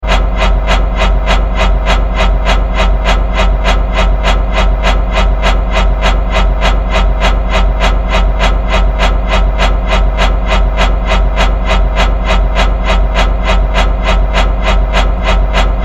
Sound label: Fan Blade Rotate, Fan, Blade, Rotate, Steady; 5.1